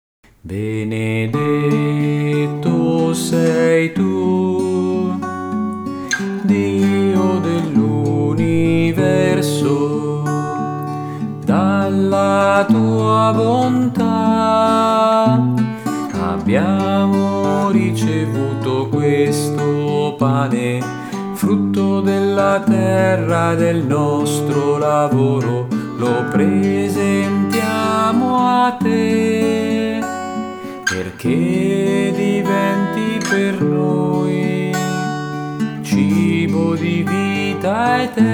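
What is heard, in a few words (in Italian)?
contralti e uomini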